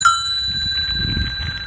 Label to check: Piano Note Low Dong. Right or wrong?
Wrong